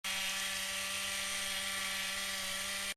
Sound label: Toy, Small: Robot Move Effect; Miscellaneous Toy